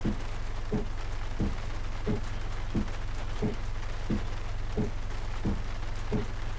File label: wiper